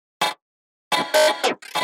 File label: VOX LOOP